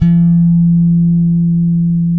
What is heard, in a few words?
Bass, LATIN, F#4; Spectrasonics; Bass Legends; ROUND LATIN